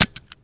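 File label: grass; Mafie 1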